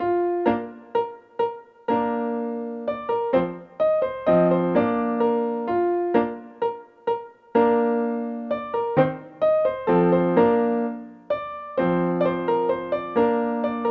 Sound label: minuet